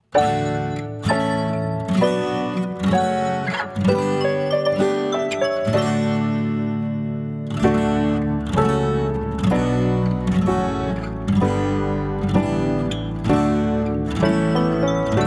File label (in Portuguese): playback o amor vive infantil; semana santa